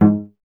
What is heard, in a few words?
STR VC G#1, Cello; Miroslav Vitous; Solo Instruments VOL-1